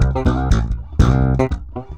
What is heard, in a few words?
C 120 Steppin Funky